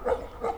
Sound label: call